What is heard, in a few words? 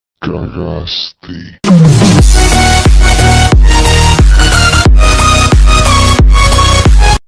Baritone Music